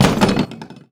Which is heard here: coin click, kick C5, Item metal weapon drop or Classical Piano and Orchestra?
Item metal weapon drop